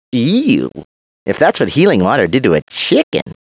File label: ewww